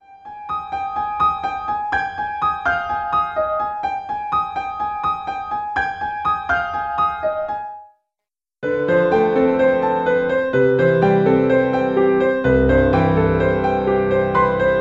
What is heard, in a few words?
intermediate piano solo; This piano solo is a journey to the top of a mountain peak.